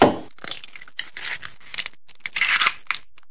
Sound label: egg cracking